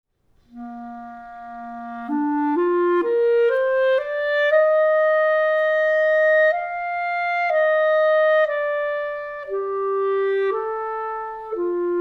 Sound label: Metal Clarinet